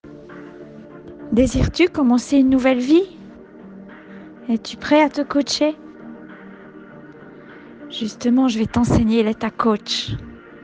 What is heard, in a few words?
meditation